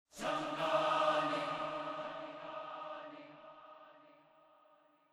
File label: MUSIC